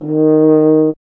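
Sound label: brass French horn; Miroslav Vitous; Solo Instruments VOL-2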